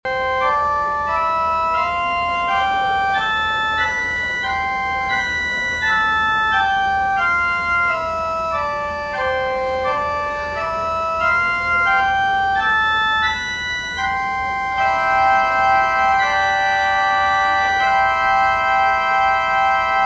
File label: tiny pipes